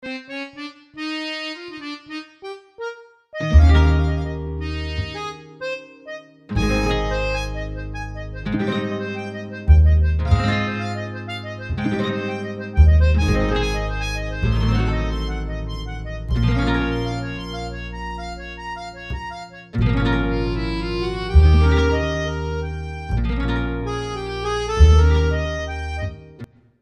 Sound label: world french musette